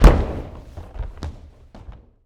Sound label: Boxing Ring Bodyfall